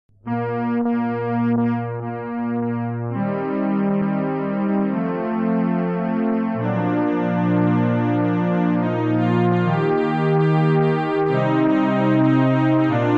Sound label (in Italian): solo musica